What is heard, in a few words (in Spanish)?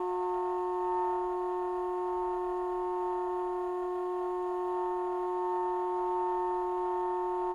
Multiarmonicos